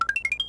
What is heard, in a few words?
1up